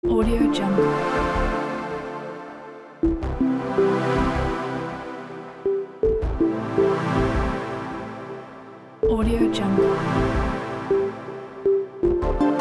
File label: chill science educational loop; ane novak